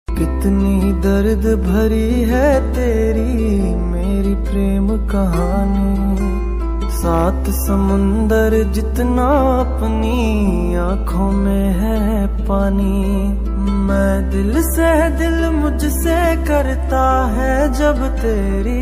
love ringtones

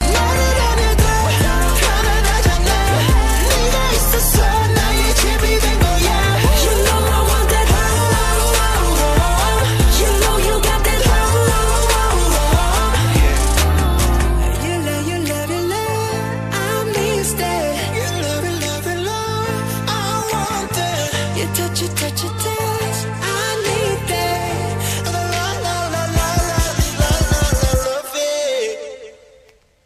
korean ringtone

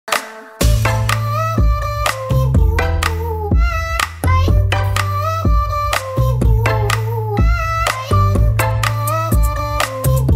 BGM korean ringtone

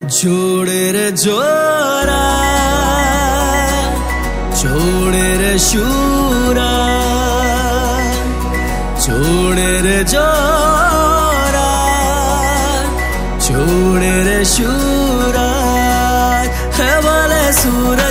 Gujarati romantic ringtone

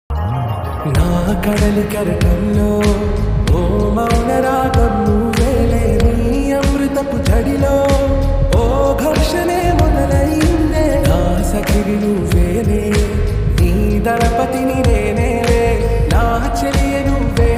lofi, Telugu ringtone

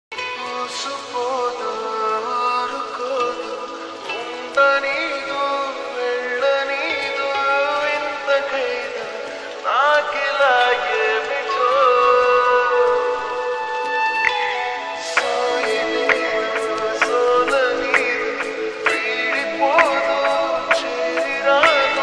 tamil ringtone

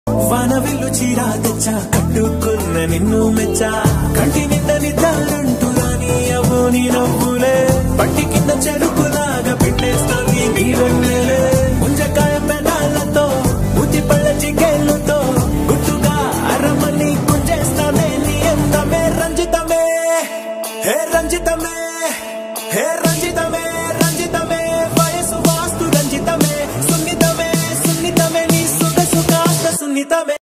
telugu ringtone

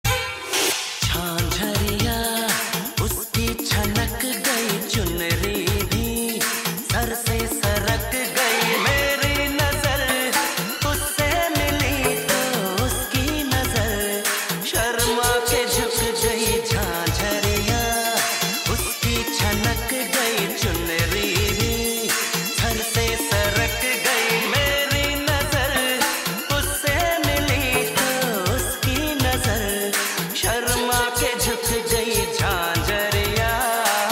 Male Version Ringtone

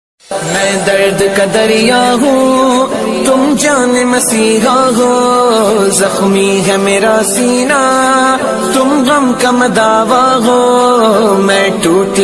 islamic ringtone

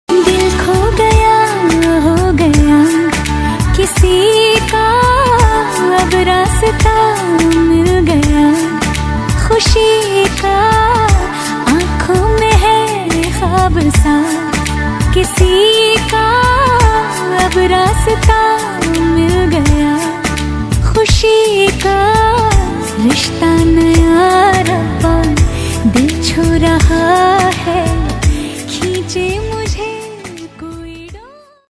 female hindi ringtone

